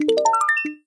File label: randomize item; tiki kart 3d